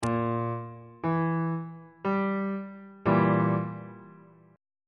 d double sharp diminished 2nd inversion on bass clef